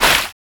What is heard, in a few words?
Idle squish